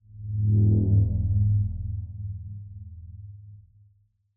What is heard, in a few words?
whoosh